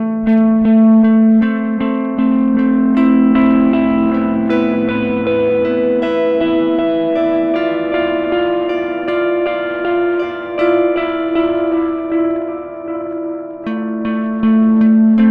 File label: Starlight Echo Station Analog B